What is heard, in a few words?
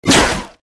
arm swing; npc; sporecarrier